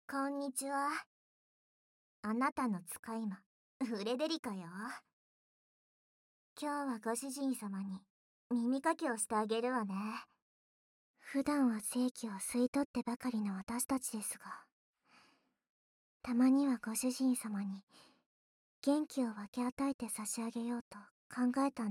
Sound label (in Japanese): 小悪魔たち の 耳かき